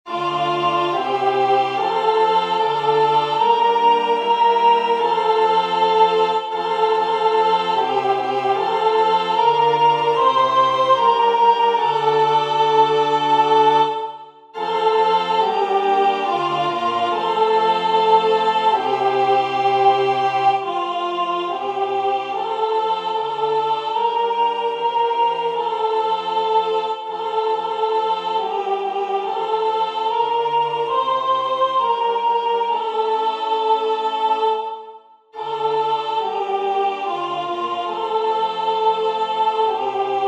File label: Agnus Dei soprano; singing divine service iii in parts